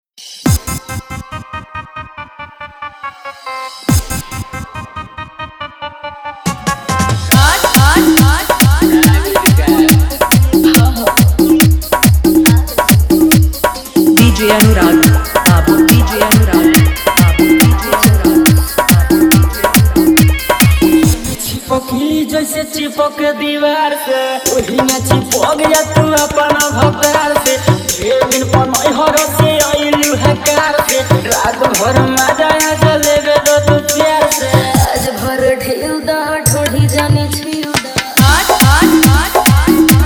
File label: Hard Jhan Jhan Bass Toing Bass Mix